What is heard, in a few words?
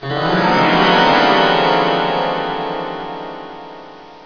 tension; Thief